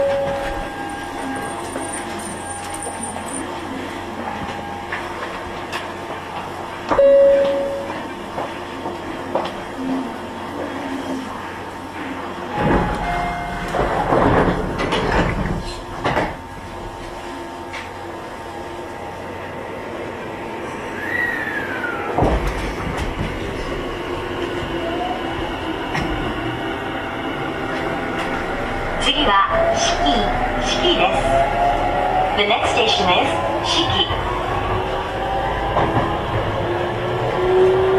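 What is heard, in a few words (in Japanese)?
走行音, 50000系; Tobu; 朝霞台-志木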